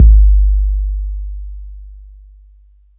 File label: Bass Drop, 808; Street Anthems Sound Kit